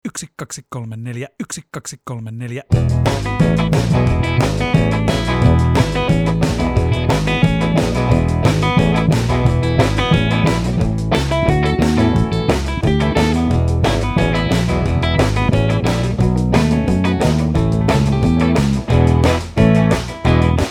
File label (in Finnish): ilman laulua; alkulasku; jakokulma